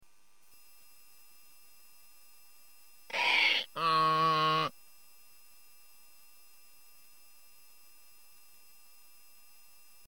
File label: donkey; Esel; Tierstimmen; Bigpoint